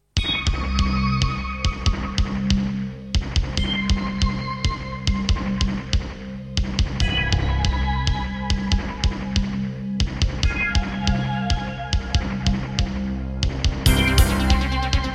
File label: Creative Sound Blaster AWE64 Gold